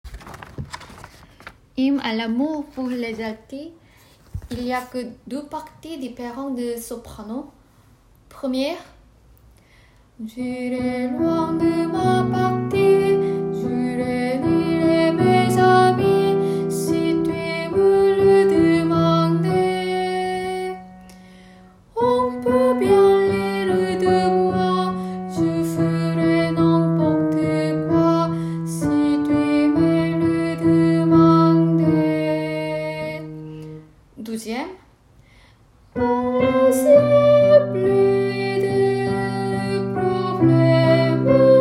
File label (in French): alto